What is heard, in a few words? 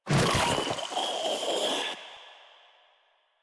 Proto Infested Ancient Attack; Warframe; Ancient Ninety Nine